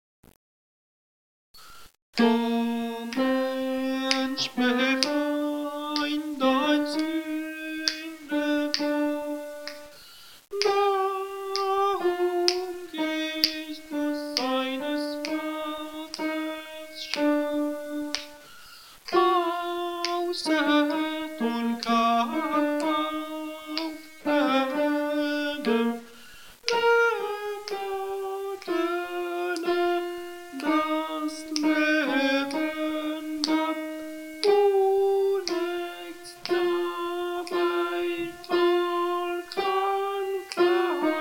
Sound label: o mensch Alto